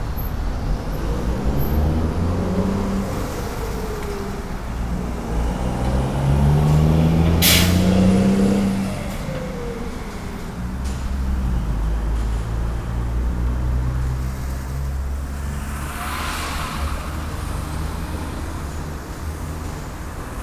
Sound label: car hydraulic suspension and acceleration; city